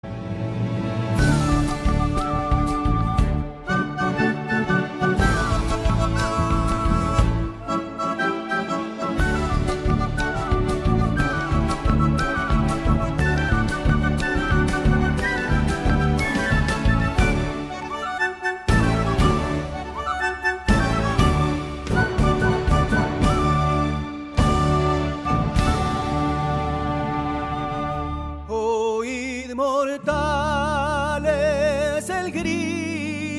Himno Nacional

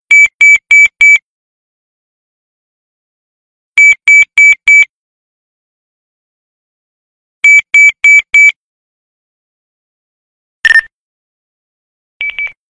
Timbre de Radio Nextel

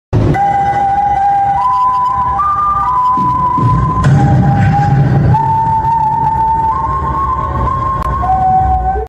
Silbido del lobo

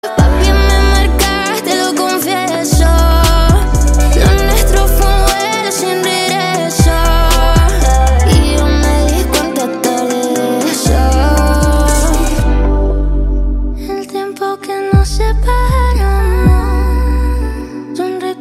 Tono de llamada

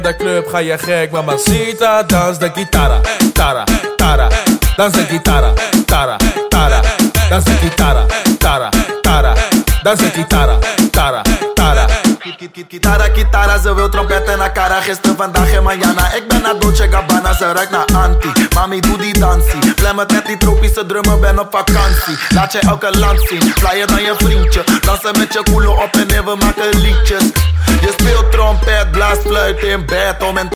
Guitarra

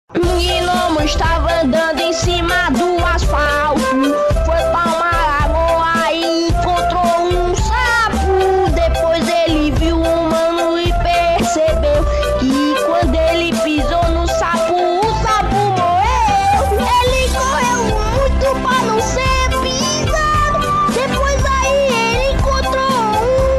Niño brasileño cantando la canción

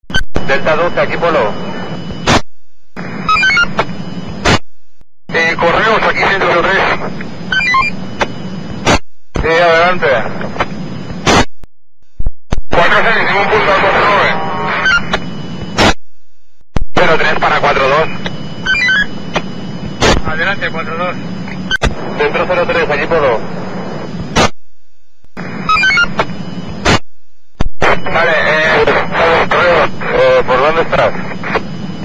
SONIDO DE RADIO POLICIA EMISORA

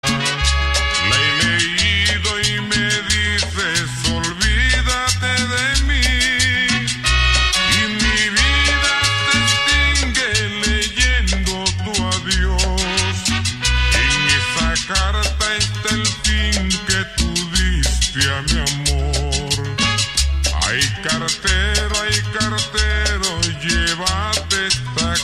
Cumbia Colombiana

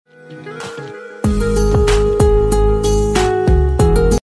Romantic tonos

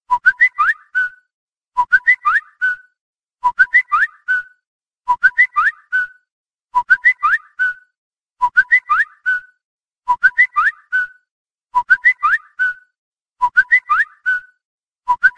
Silbido; Whatsapp

